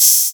clicky open hi hat one shot g key, Royality free open hi hat tuned to the G note